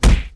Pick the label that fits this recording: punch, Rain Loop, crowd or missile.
punch